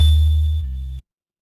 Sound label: retro game coin